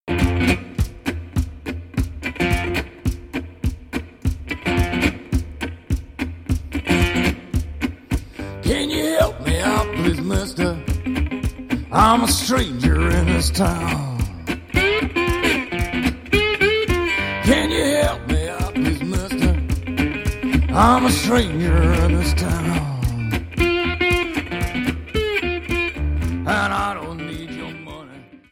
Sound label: Blues